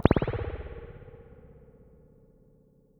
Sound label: Spring